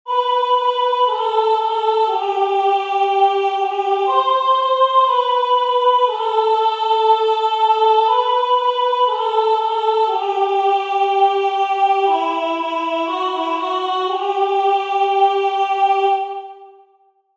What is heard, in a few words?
Soprano; UBI CARITAS